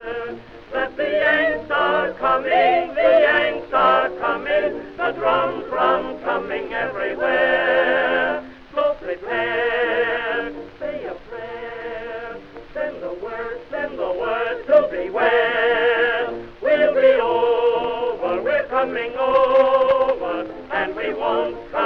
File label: baritone and chorus with orchestra